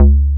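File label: JUPITER, Synth Bass; SYNTH-Basses; Sound & Vision; Gigapack I CD 2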